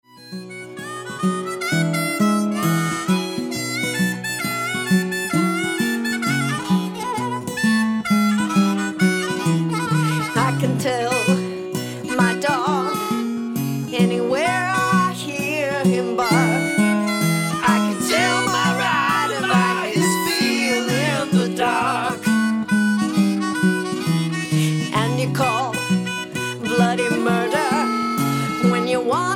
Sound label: blues